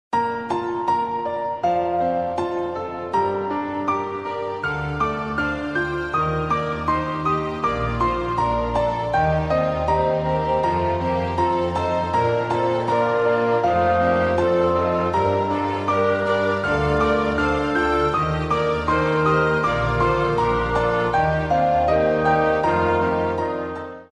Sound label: Canon; SKY IM-U310K; Movie Story BGM